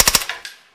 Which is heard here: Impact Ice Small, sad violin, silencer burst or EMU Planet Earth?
silencer burst